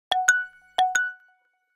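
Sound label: Email, phone notification tone; Nokia; E7 Belle India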